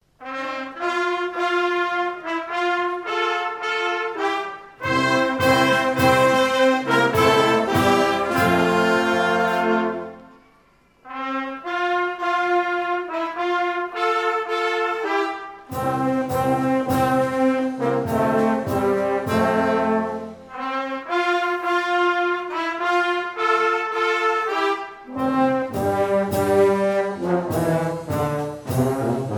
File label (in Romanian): Fanfara; Luni - Ajunul nasterii Domnului Isus